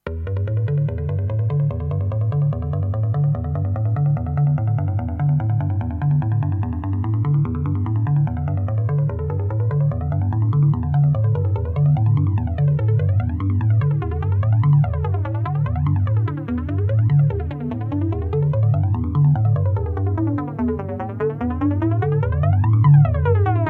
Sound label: Flanger Bass Sequence